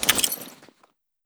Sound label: draw, wpn